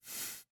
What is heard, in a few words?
ui dialog open; herozerogame